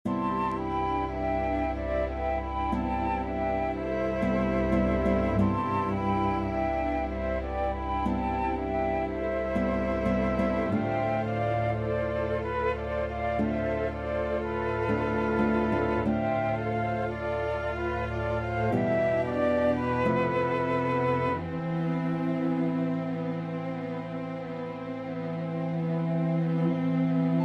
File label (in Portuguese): orq sem piano